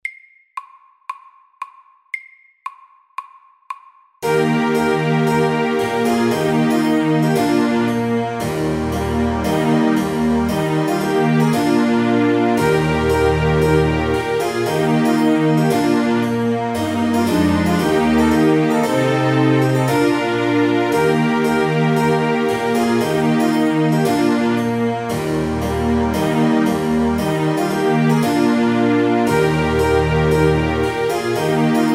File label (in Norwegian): Trumpet tune MIDDELS